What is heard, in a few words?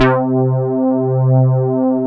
P800 PBS2 C3, Korg Poly800; Back In Time Records; Korg Universe VOL-1